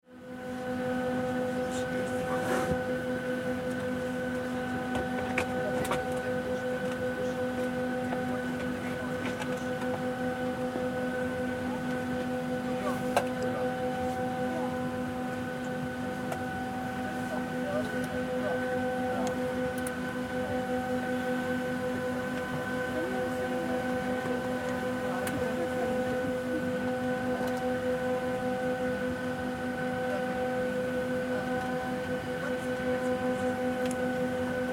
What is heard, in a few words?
Vehicle Airplane Boarding Interior Commercial Airline; Airplanes